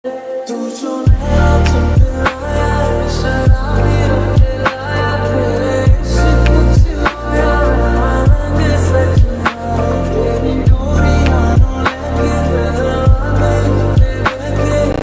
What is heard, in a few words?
Song Ringtone Remix